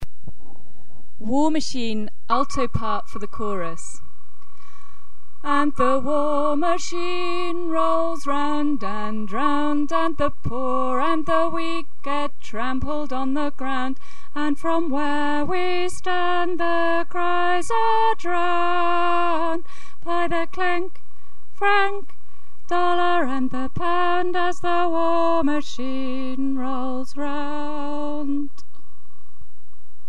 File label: alto